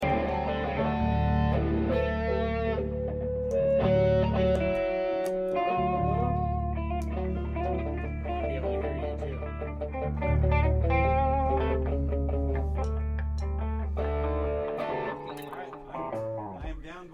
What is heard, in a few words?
Room Mic